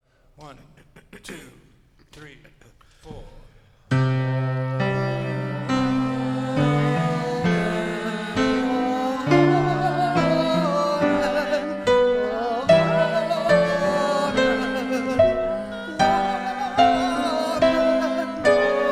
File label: Amen with vocals